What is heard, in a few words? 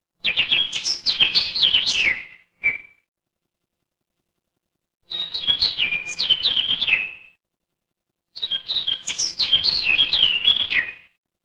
ambience forest